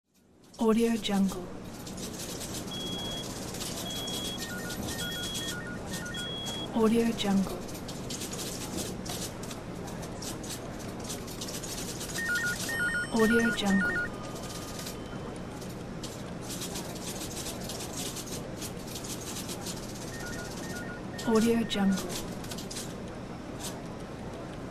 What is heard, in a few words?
office ambience; sound-create